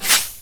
basicattack; Vayne

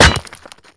basicattack; LoL; twitch

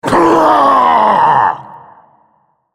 ultimate effort; Hecarim

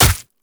basic attacks; Zyra

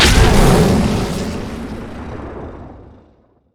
roar; majestroar; Volibear

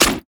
missile barrage; LoL; corki

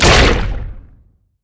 stretch strike; LoL; zac base

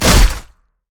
basic attack knee; rengar

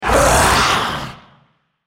Dragon spell cast effort; Shyvana

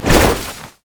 snowday basicattack; LoL; ziggs snowday

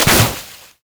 minefield; LoL; ziggs poolparty

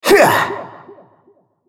spell cast effort; RumbleSkin03

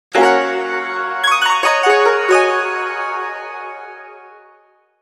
laugh; GuqinSona; Character Voices - English

